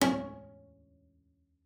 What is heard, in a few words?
C#4, bass pizz; Xsample VOL-5